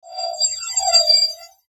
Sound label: Sweeper Bright Metallic Scrape; Production Element Imaging Element Accent Transition